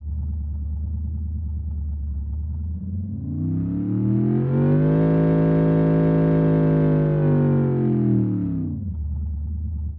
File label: v8 american classic, engine sound